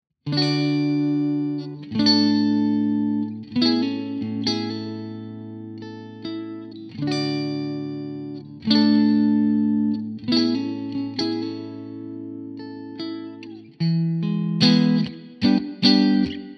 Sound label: clean; jensentone